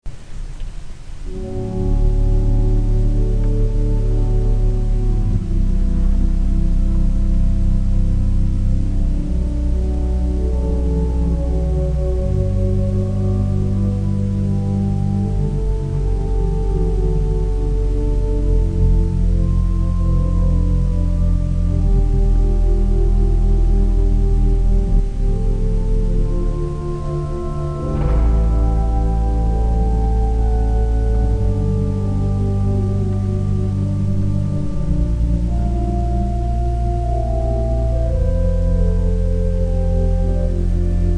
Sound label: organs; AdoroTe